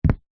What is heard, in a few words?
foley footstep single sneaker on plastic walkway